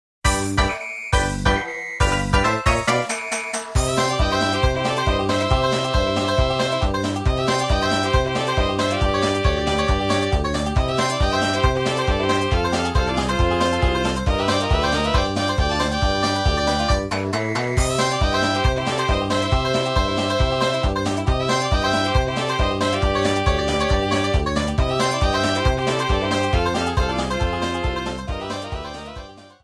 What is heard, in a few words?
Music, Moo Moo Farm; MK64